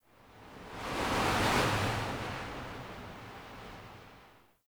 Wind Airy Gust; Ambience; Dungeons; Under City

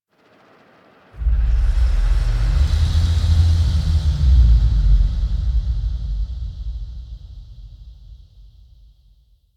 Exhale Large, Ambience

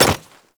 Hyena Micro Step Default, Footsteps; Warframe

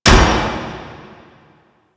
Fist Impact Metal; Enemies; DE; Warframe; Tyl Regor; Fists

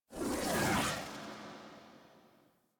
Radial Blast Sword Dissolve; Warframe; Excalibur

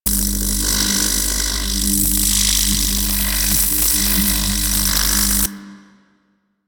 Sentient Laser Beam Loop; Warframe; DE; Sentients; Sentient Trooper

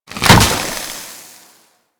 Juggernaut Venom Cloud Launch C; Warframe; Infested; Attacks

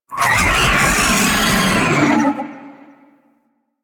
Vocal Pain Laser, Enemies; Warframe; Teralyst; Ropalolyst

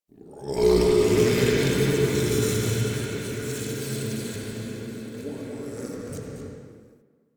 interior texture gurgle; Ambience; Misc Indoor; DE; Warframe; Infested Eris

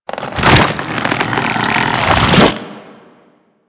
Golem Grenade Charge; Warframe; Attacks

